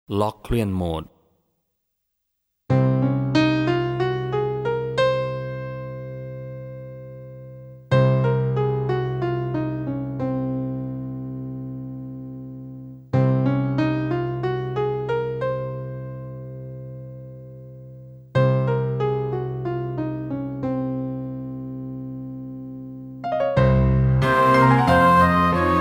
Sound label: locrian